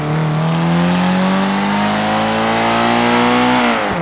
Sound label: second, vehicles; gmod; tdmcars; mr2 gt